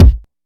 nice kick sound a sharp key, kickdrum tuned to the A# note. Loudest frequency: 231Hz